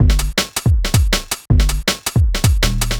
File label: Beat, Drum N Bass